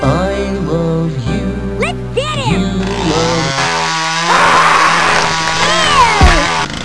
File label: chainsaw